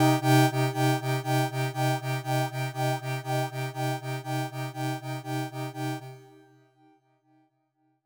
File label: Speed Synth